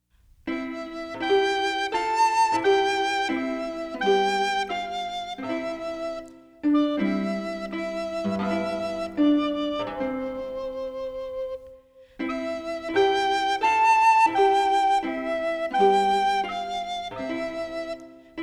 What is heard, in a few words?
father up above music only; childrens songbook